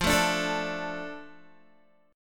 F 6th F down Guitar, chord; F6 strummed

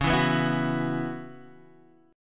Db Minor 11th Db

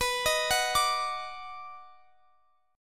Eb Minor Major 7th Sharp 5th B, chord

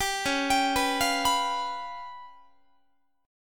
Db 7th Flat 5th Db, down, Guitar; Db7b5; arpeggiated; tuning=4A,4E,5A,5D,5G#,6C#; 22,21,22,21,21,22

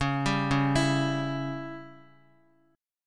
Db Diminished, Guitar; arpeggiated; JGuitar

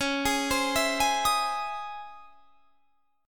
Db Minor Major 9th Db, chord; arpeggiated; DbmM9; JGuitar

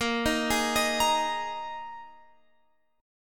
A sharp 7th Suspended 4th A sharp, Guitar, Open F; A#7sus4; arpeggiated

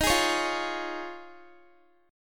Eb 7th Suspended 2nd Suspended 4th Eb, Guitar; Eb7sus2sus4; strummed

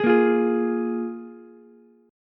F Minor Double Flat 5th F Ukulele; Fmbb5 strummed; JGuitar

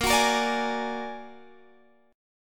Bb Augmented 7th Bb down Guitar Standard; strummed; JGuitar

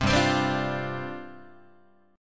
G 7th Suspended 4th G down Guitar

